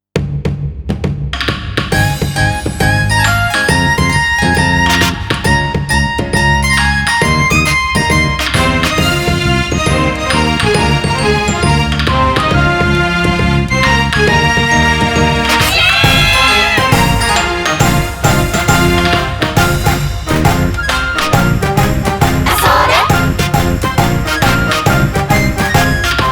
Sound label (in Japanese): 音頭 original karaoke; 例大祭SP